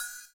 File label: CYM, Ethno Techno; ILIO - Ethno Techno